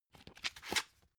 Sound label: dvd case put in booklet